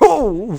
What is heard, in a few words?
ooof; SM64 CO-OP; char-select-the-originals; luigi